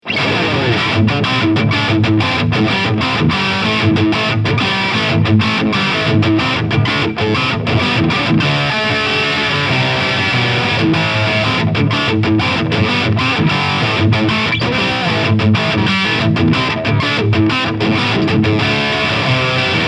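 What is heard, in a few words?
Lead, guitar; LTD MG 1001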